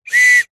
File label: foley whistle short toot